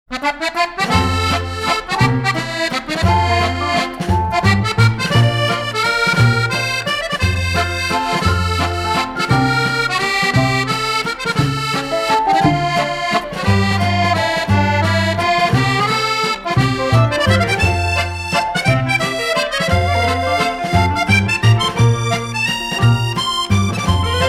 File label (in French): Accordeon, accordéon musette